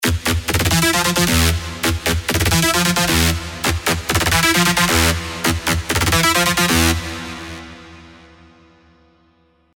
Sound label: Chaotic Rave, big room techno drops; revealed serum 2 big room techno drops vol 1